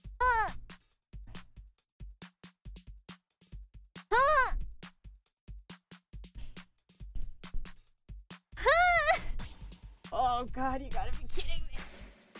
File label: noises